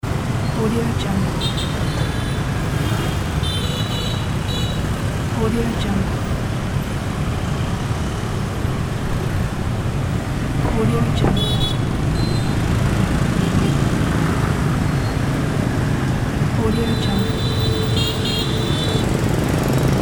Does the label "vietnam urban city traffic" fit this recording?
Yes